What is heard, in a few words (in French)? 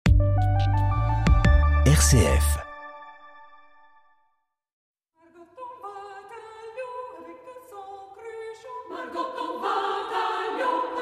chorale; La chorale Amarelli de Mereville; Lorraine terre de choeurs; spectacle 35 ans